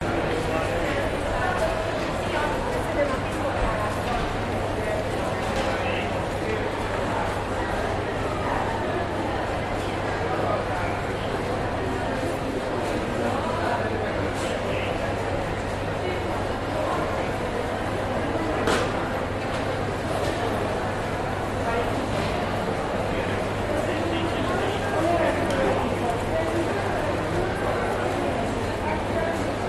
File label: Markethall Walla People Chatting And Walking; Budapest Hungary